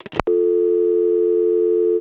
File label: ring off long